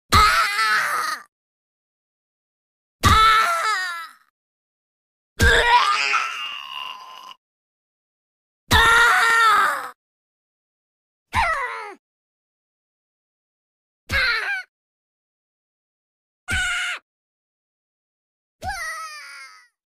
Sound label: chicken gun dead sound; gaming tv